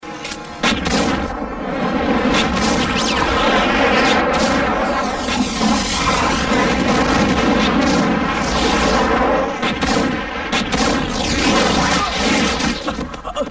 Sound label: gun fire; matrix